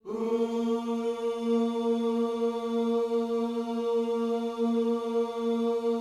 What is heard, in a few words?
OOS A#3, GOSPEL; Spectrasonics; Vocal Planet